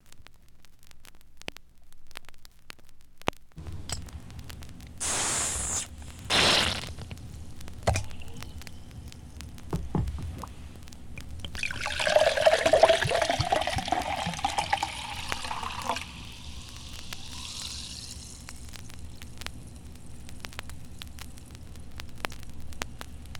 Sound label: Pop Bottle Opening And Pouring